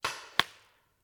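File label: Paintball Gun Impact; Sound Ideas; Guns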